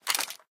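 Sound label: inventory items ammo; STALKER; IWP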